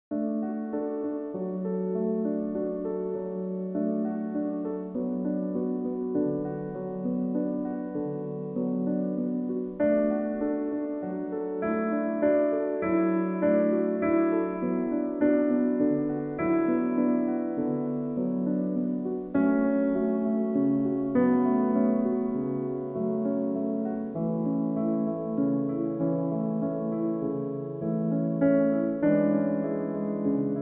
Piano Bar Music; Soothing Piano; Peaceful Textures

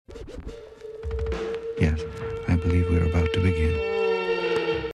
Breakbeat Cut-Up

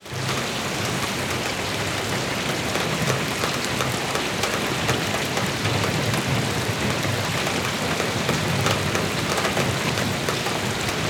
ASMR Rain Sounds - Rain Sounds; Relax

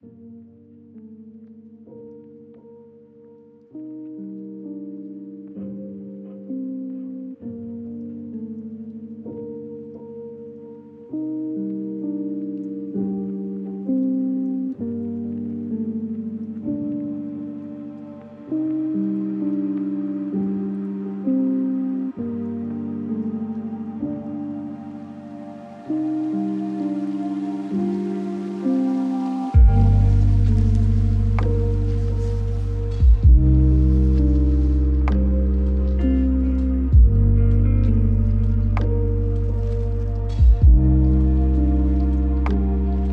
Chill Moon Music

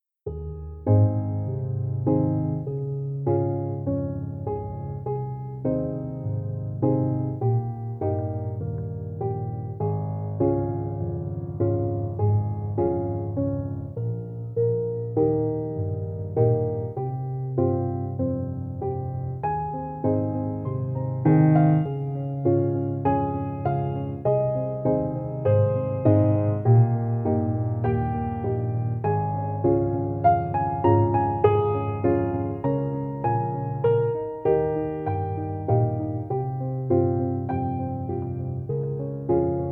Relaxing Classical Piano Music

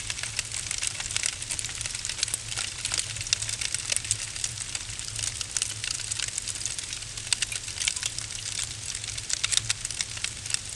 Piano

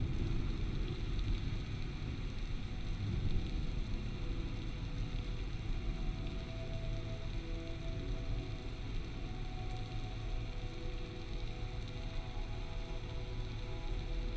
Soft Rain

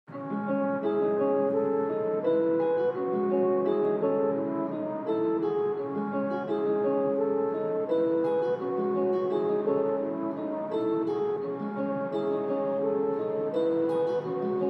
41hz Low Bass

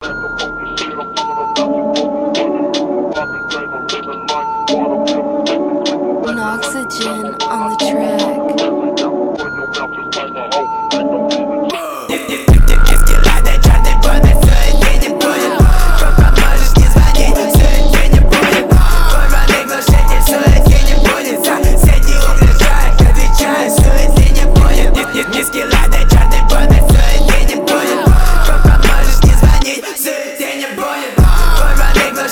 Phonk

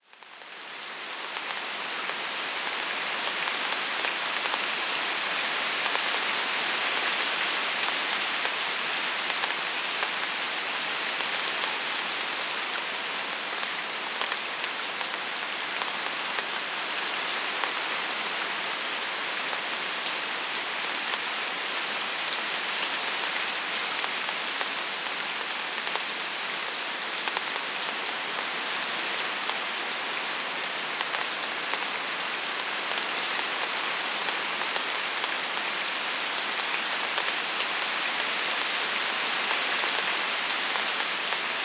Rain Sounds Nature Sounds

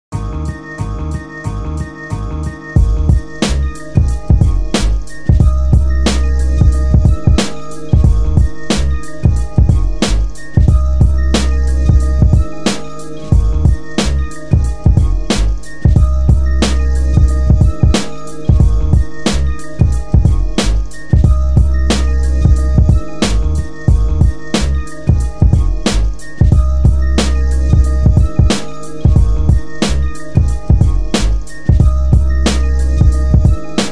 Rap Beat

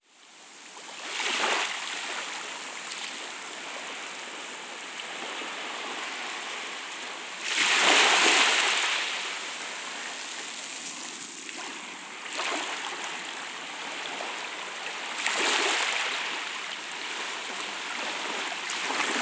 Ocean Waves Calm Ocean; Ocean Sounds; Ocean Waves For Sleep

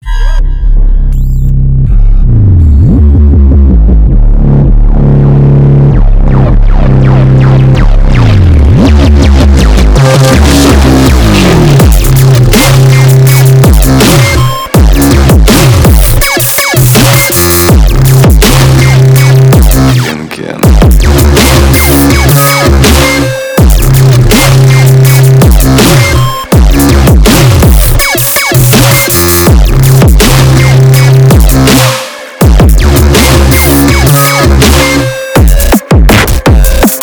beats

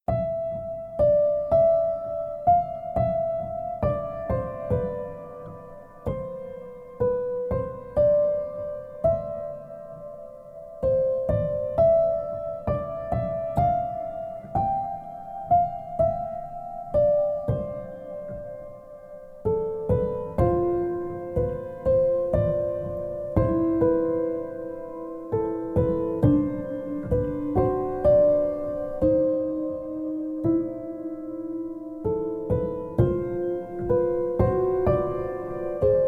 Piano Duet

